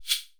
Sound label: Slider